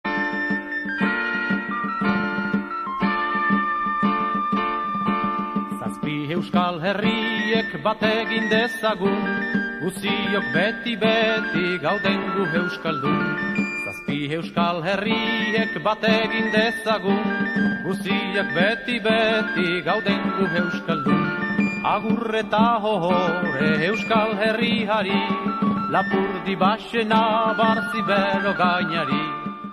sonnerie basque ringtone

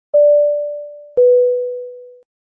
sonnerie avion ceinture

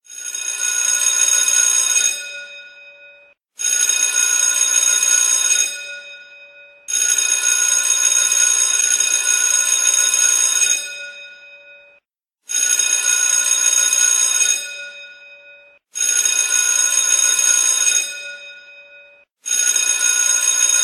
la cloche de l'ecole ringtone

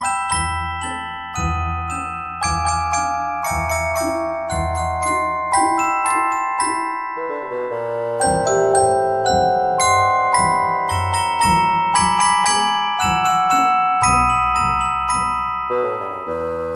casse noisette ringtone

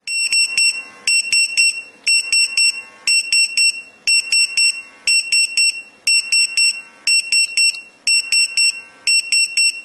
bip pompier ringtone

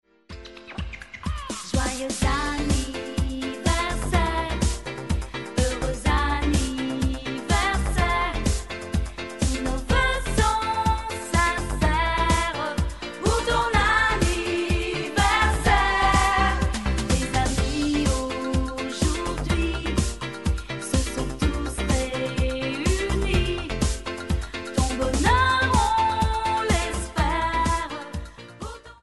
sonnerie joyeux anniversaire

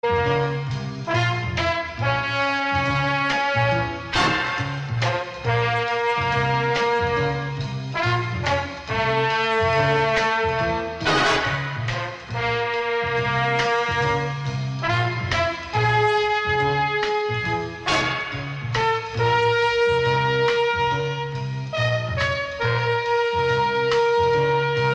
sonnerie

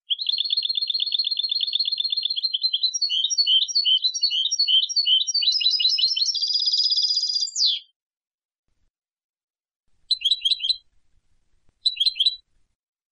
chardonneret algerie

